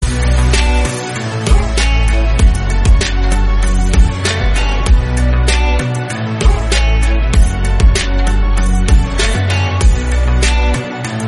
INSTRUMENTAL SONG RINGTONE

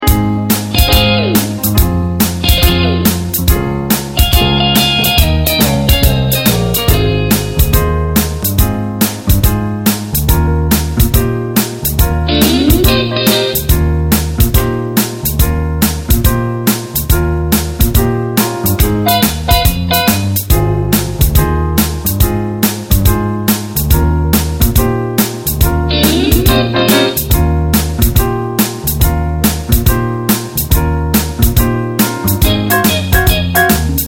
INSTRUMENTAL RINGTONE

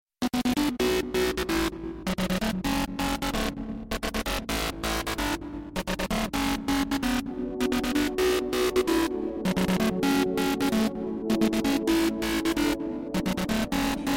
BEATS RINGTONE

